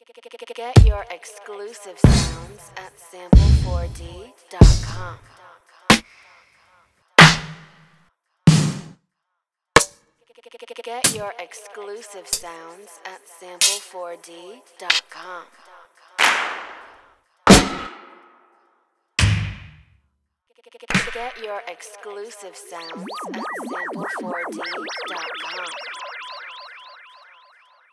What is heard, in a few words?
Boom Bap Drum loops; sample4d